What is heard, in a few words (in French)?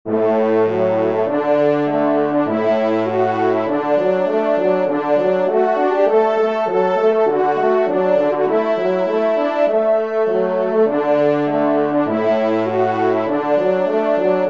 trompe; Rencontre à Notre Dame d'Anvers